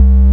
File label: BASS, Drum & Bass, 145 BPM; Ueberschall; Drum & Bass Flash Trackz